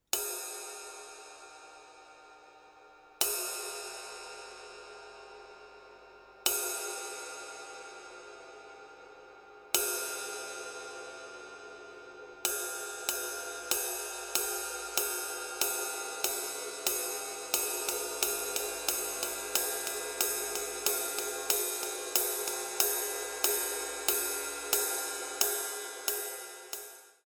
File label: Flatride Singles; Meinl Byzance 2022